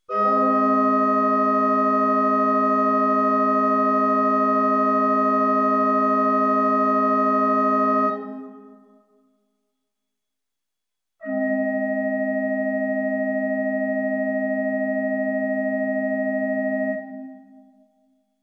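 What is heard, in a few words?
open and stop diapasons A flat chord open position fourth comma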